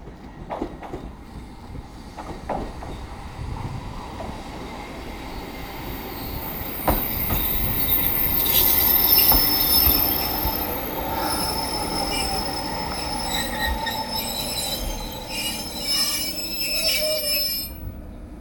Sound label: train